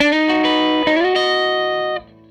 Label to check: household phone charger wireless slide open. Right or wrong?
Wrong